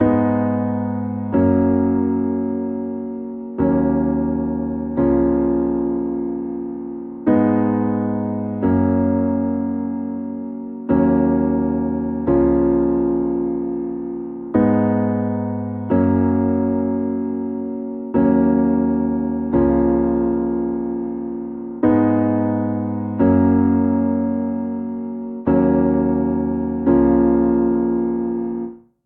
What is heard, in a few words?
Progression Ballad Pop i - bvii - v - IV Ab Minor 66 bpm, Grand Piano; MIDI Clips; Ableton; Factory Packs